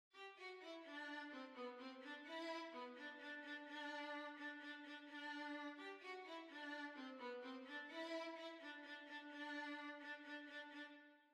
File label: Violin